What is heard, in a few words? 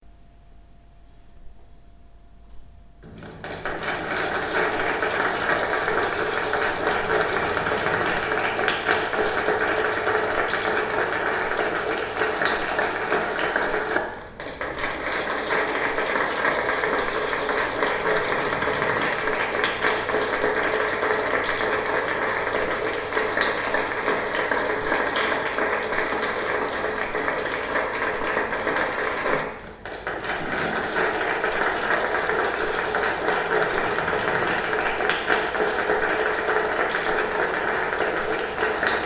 2 Room Test Pattern; Berlin; Home Setup